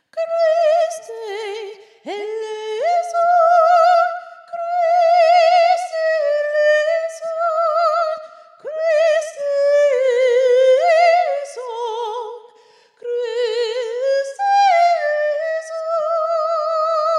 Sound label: Audition Kyrie